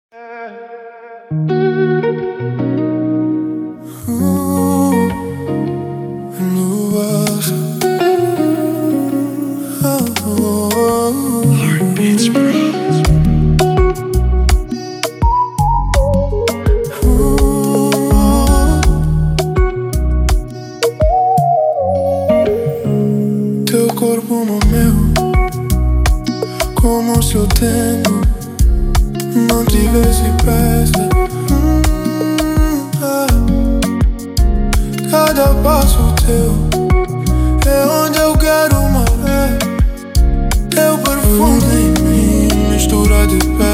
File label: 83 BPM; HeartBeats Pro